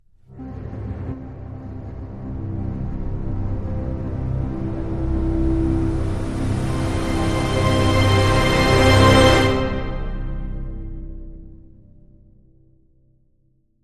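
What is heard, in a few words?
Dramatic Sting, No Percussion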